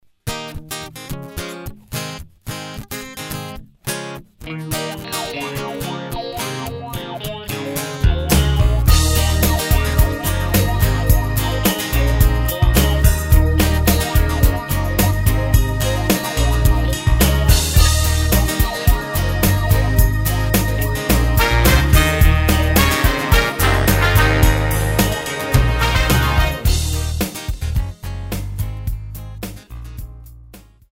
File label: No Sax Key of Eb, smooth jazz backing tracks